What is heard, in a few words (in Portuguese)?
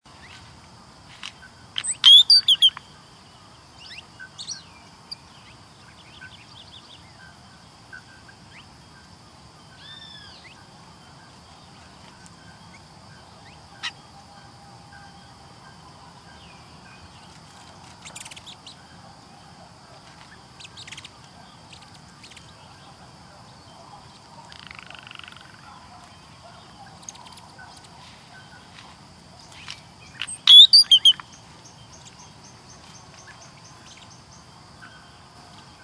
canto da irauna grande